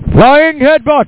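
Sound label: lost souls; supr doom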